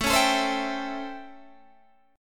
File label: A 7th Sharp 9th, Guitar, Open G